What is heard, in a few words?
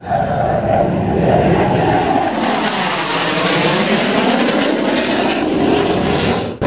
MiG-21